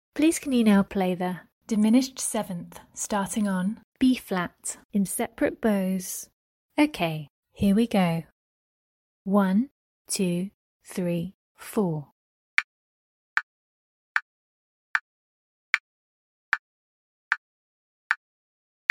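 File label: diminished 7th starting on B flat sep bows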